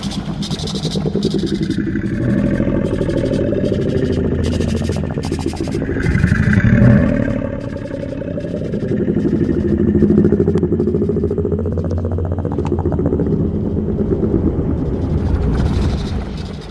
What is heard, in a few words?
smoke loop; cstrike; nippersounds